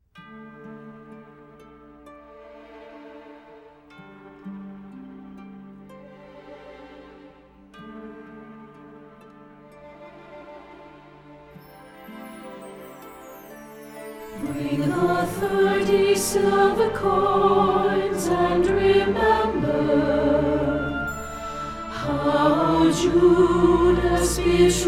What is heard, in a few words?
Soprano